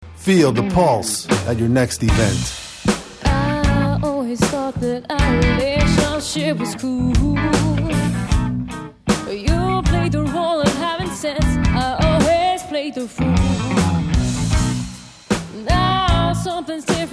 pulse

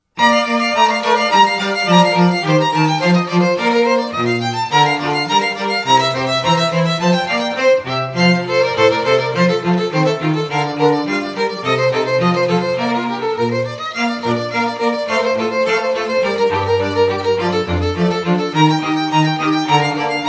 Strings

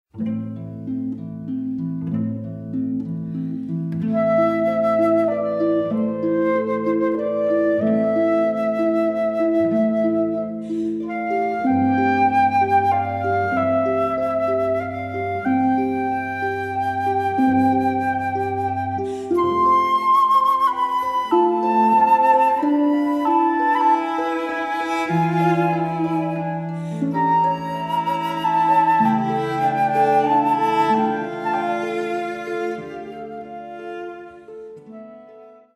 Harp

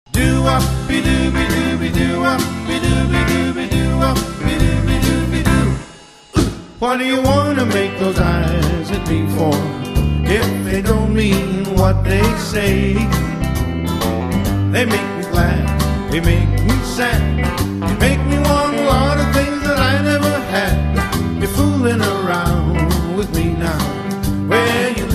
Guitar; RocknRoll

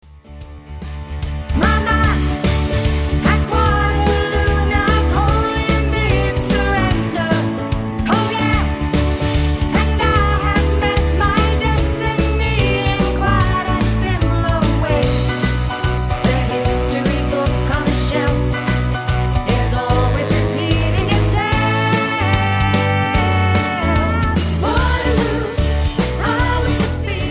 Bassline

